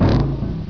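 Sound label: gargantua step; svencoop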